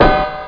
bounce; CHASM

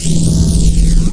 energy bolt

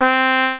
bugle; NetHack

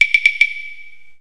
wood blk; Select Soft Publishing Stock Clips Multimedia Clips; buttons

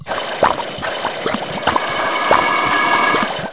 Smelter; REDNECK; RAMPAGER

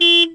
small beep; Lemur 3.0.1

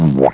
SLUDGE; RpgMaker

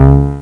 Bump; Beep sounds

